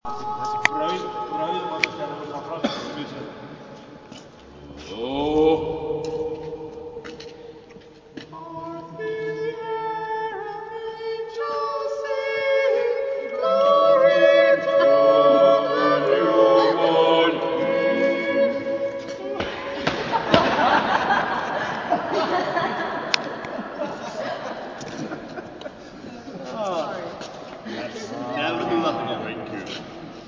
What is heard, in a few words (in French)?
A capella Eglise; Eglise de Brou